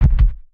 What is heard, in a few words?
damage hits explosions, Warships